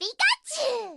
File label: Massage Ringtone; Pikachu